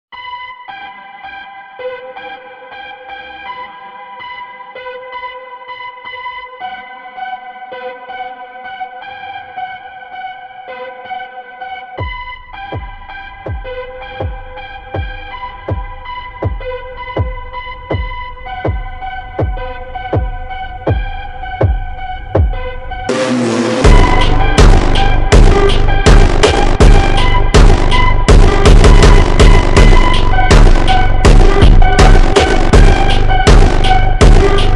looped slowed

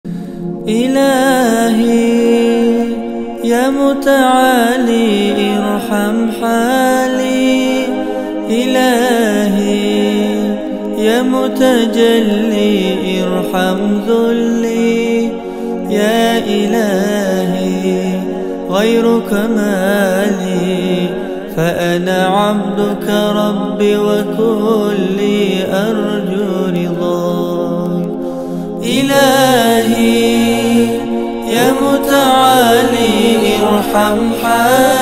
beautiful nasheed

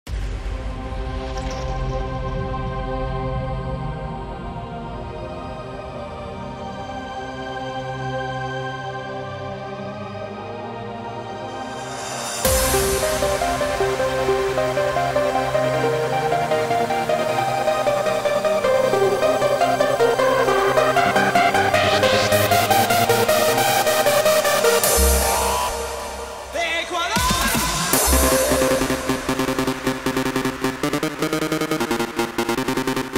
hardstyle remix

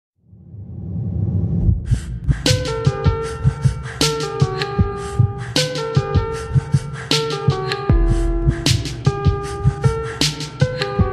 slowed reverb remix

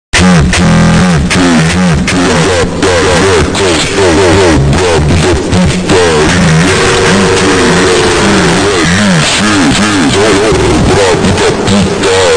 ultra slowed

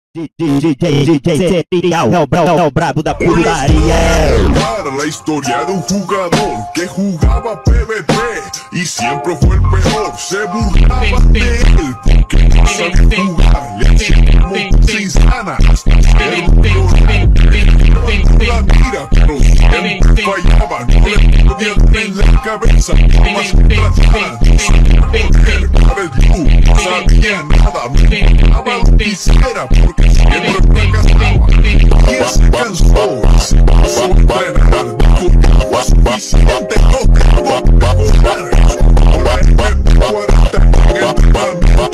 funk slowed

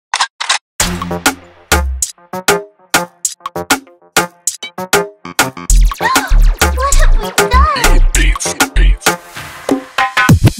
dancehall instrumental moombahton type beat

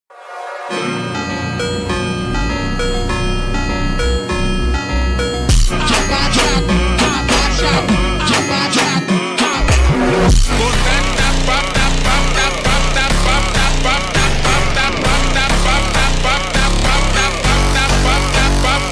slowed brazilian phonk

